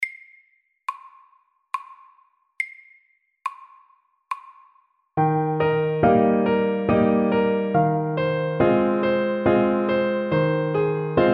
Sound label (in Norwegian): Vals SLOW 70